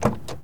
Mustang Door Open, Automobiles

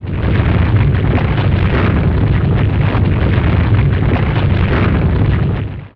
Quake Pyramid Move Loop; Destruction

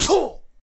Boxing Male Spit Mouthguard

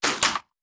Bullet Impact; Sound Ideas; Guns

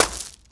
gravel land; firefight

